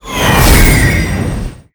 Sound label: ShiningBird; Kundun